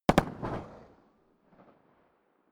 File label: rifle ak-47 exterior fire double distant; comedy cartoon; pistolen gewehre; Pistols and guns